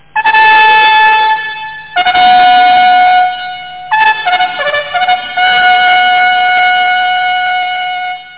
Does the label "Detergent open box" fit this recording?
No